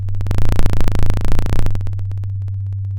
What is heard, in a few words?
pop, chainsaw